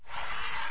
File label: selection reverse; ChromeVox Classic Sounds